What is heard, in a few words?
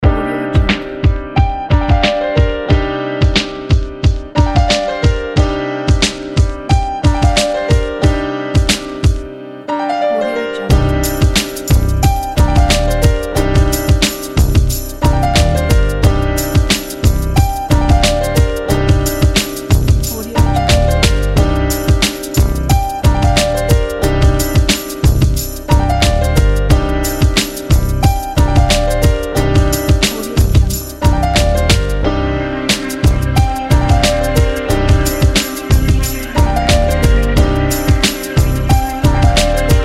hip hop street